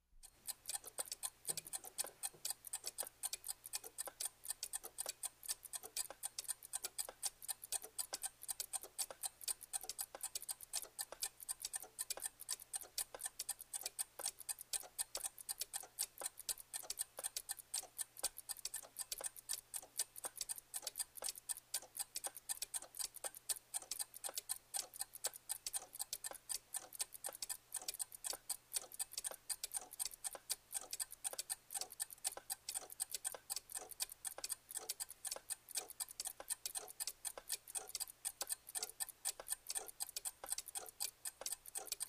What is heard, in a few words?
Many clocks ticking; Technology